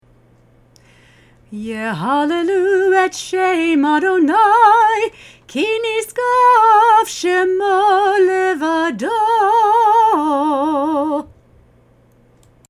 Yhallu Chanted

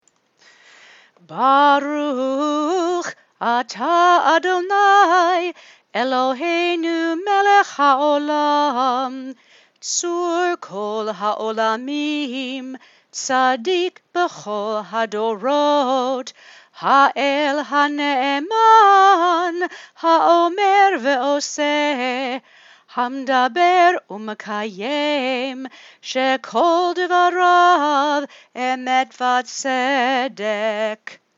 Blessing After Haftarah Chant

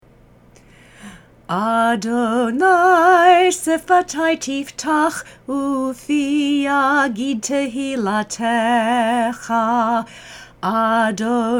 Tfilah Chanted

